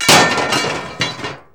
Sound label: PAN DROP; E-MU Producer Series Vol. 2; More Studio Essentials